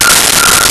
Whistle F5; Nintendo; July 2020 Gigaleak

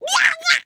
death violent, grunt involuntary; covy involuntary

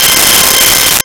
Draw Bar Organ C6; Nintendo; July 2020 Gigaleak

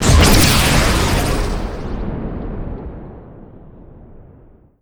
fire; c gun tower

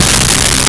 Slap Bass C3; Nintendo; July 2020 Gigaleak

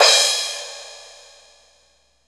Crash Cym 1; BGM; Drums; Nintendo; July 2020 Gigaleak

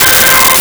Oboe C5; Nintendo; July 2020 Gigaleak

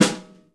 Snare, c4; Nintendo; July 2020 Gigaleak

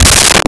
Taiko; Nintendo; July 2020 Gigaleak

